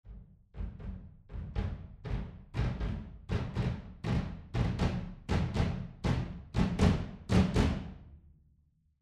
Kick Ensemble; Cerberus

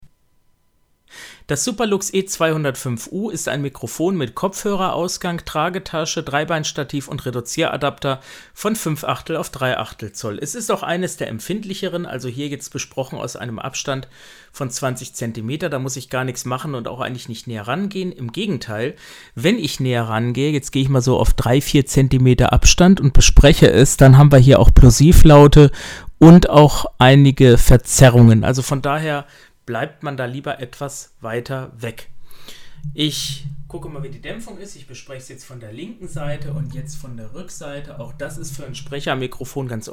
Superlux E205U

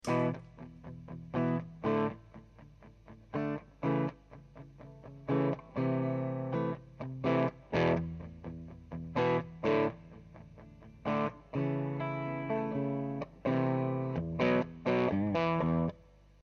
gain clean; od250

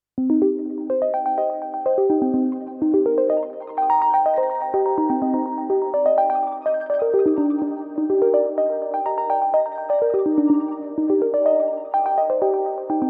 Arturia V Collection X Mini V4; Butterfly Effekt

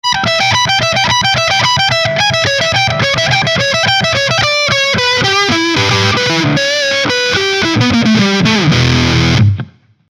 Jackson DK2 Middle Bridge dist

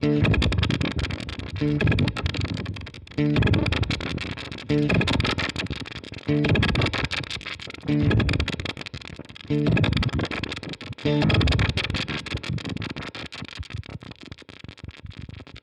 Modulation Transposition Square; Red Panda Raster V2

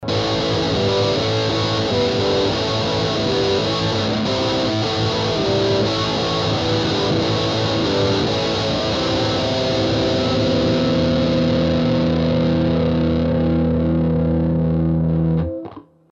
gitarre classic metal; boss gigcaster 5

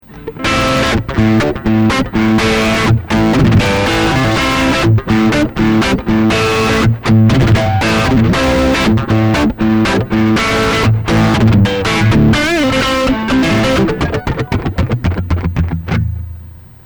Lausy Ending Riff

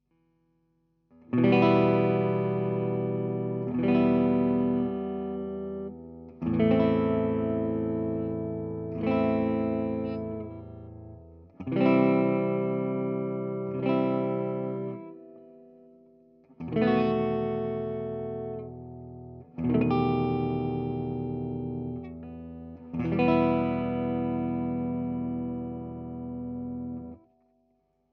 Presonus64S Les Paul

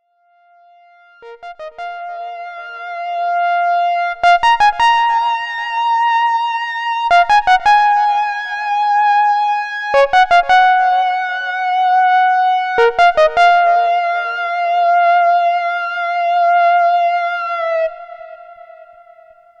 moogish

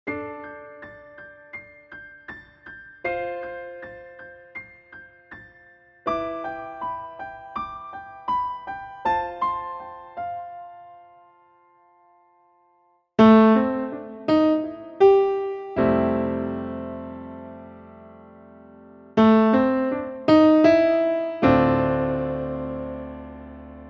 Yamaha CP88 Piano

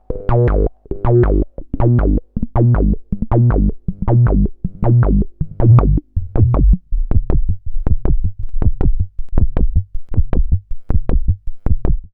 Liquid Foam Loop; HerbsAndStones

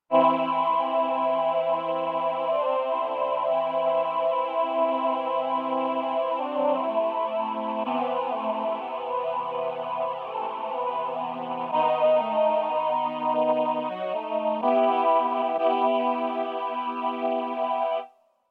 Male4 Female4 Ensemble VC340

